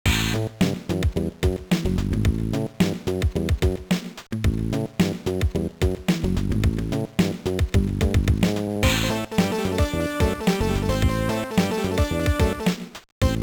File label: Score Ranking; Nicktoons: Freeze Frame Frenzy; GBA